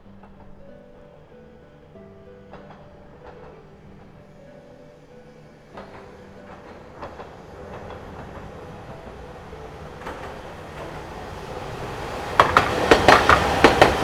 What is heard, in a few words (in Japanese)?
路面電車 通過